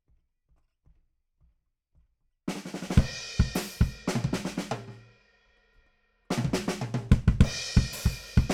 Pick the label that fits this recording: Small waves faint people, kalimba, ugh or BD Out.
BD Out